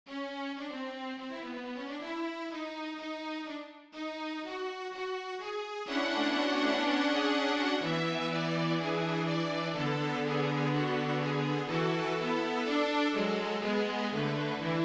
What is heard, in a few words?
strings; Sursum Corda